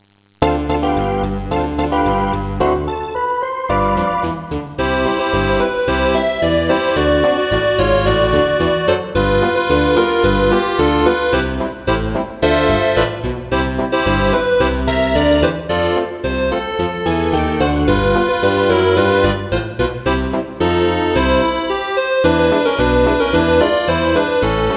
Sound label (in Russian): vesolyiy akkordeon